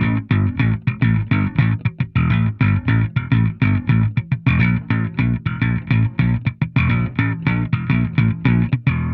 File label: 105 Pop Slap Bass Amaj; Pop Rock; E-Bass; Pro Tools Essential Loops; Digidesign